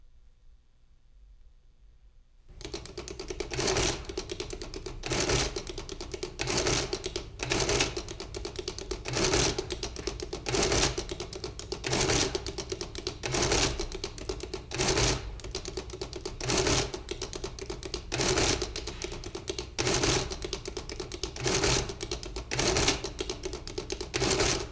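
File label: Adding Machine Sequence; Jac Holzman; Authentic Sound Effects Volume 2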